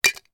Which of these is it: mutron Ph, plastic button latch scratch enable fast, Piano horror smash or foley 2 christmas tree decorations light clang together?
foley 2 christmas tree decorations light clang together